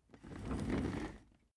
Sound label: office chair trundle slow